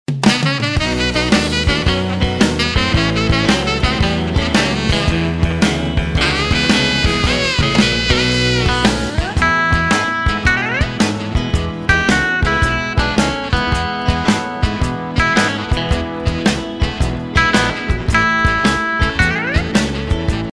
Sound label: Honky Tonk